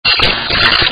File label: Dance remix